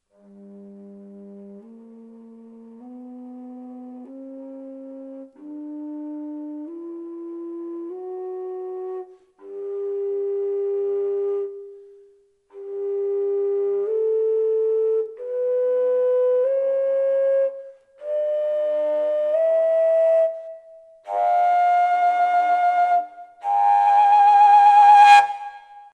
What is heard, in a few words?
fujara plain elder scale; natural fujara